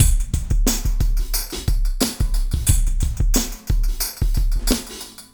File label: Smooth, R&B Drums